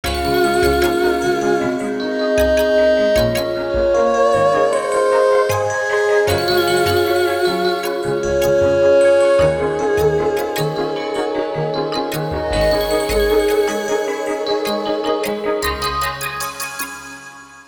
Sfx Credit; Sango 2

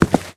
Footstep Walk Base; starbites

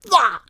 Water Splasher; Rabbids Multiverse

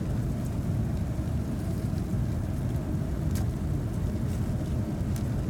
Blacksmith Furnace Loop

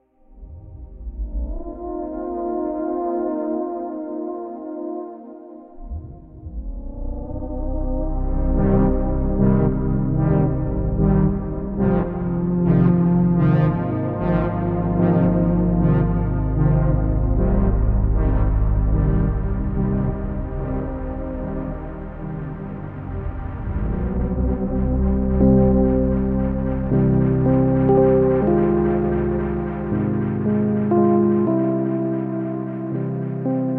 Press Conference Attack 16 Bar 75 Bpm

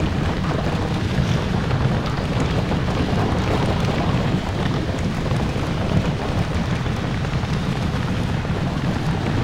Corpse Cave In Loop; Hollow Knight; Kingdoms Edge Corpse Cave In Loop

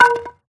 Sfx Baseball

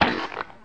Footstep Giant Toad; gamerip; Mars Sortie

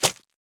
Arrow hit

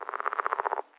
AMB China 3D Amb; rabbids multiverse; iOS, macOS, Mobile

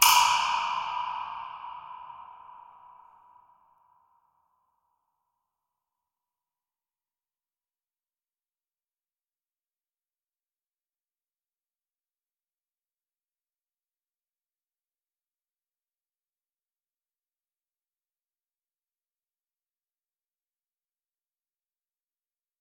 Combat loop Thunder; Combat-loop-Thunder-A; curious expedition 2 macos ps4 switch windows xbox one xbox series xs gamerip 2021

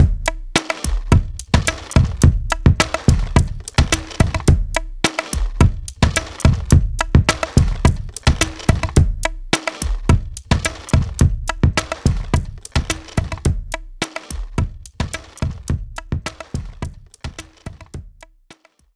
Music

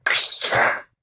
Hurt Evade; Grimm

